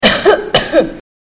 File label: female Cough